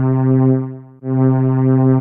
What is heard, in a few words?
Solina Cello, String Machines; ARP Solina; Ableton; Factory Packs; Retro Synths